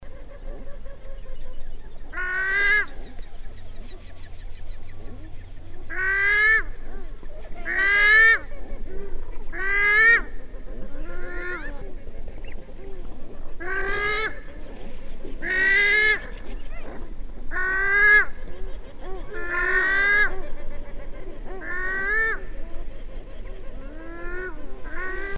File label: Australian Wood Duck